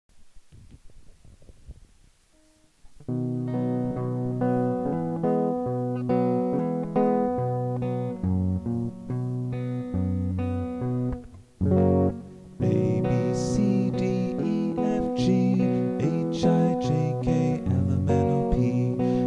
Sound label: medley abc twinkle baa baa black sheep